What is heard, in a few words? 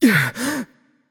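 surprised, non-verbal; voicepack; smite; merlin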